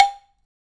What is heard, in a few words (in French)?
bambou attq